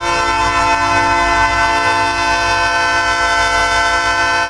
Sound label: Long, Train Horns; Nathan P3; PFx Brick